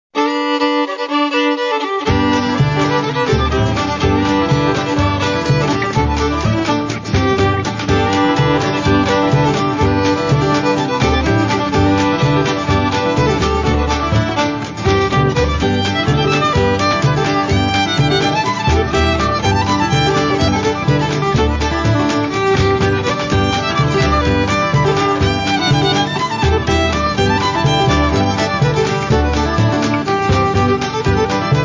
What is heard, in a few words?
polka